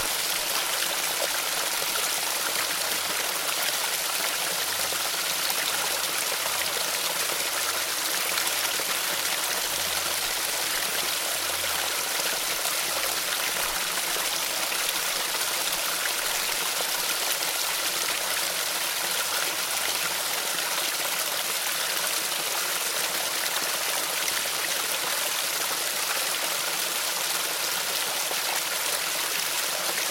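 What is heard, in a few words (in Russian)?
лесной журчащий ручей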